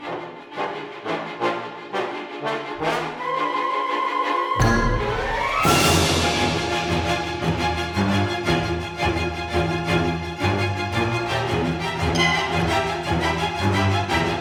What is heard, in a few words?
Action Chase; lego the incredibles 2018 switch; Elastigirl